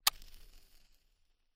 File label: back left, binaural recording; theverge